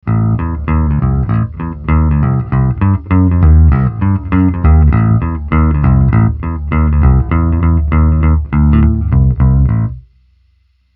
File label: Epiphone Bass Bridge